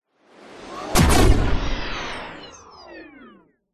science fiction gunshot with electronic charge and discharge; zapsplat